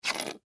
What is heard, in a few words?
Key Slide Scrape; Key Slide Scrape Short; Blastwave; Foley